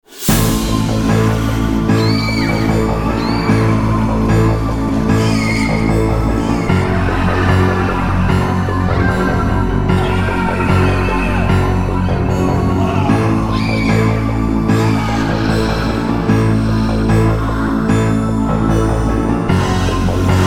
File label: Fight; Serious Sam 2